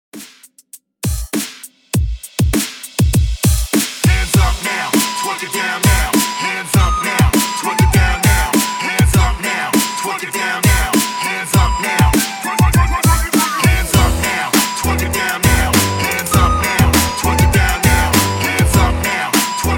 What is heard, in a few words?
twerk hype 100 bpm; SQUID GAME